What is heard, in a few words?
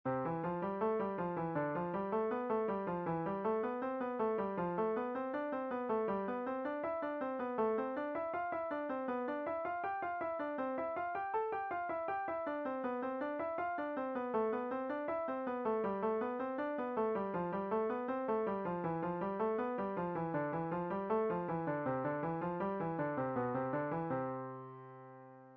Five finger stretching, piano